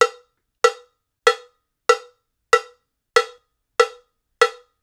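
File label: Hip Hop Cowbell, 095 bpm; Digidesign; Pro Tools; Pro Tools Essential Loops; Percussion; Bells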